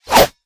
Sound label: Attack Underlay